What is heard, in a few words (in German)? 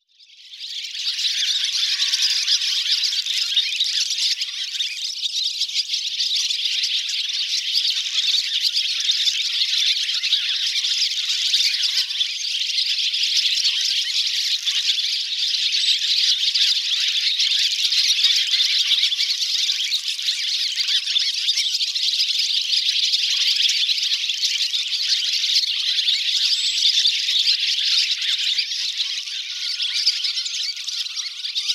rauchschwalbe